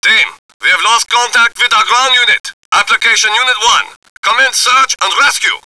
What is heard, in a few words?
search res; cs mansion; cnc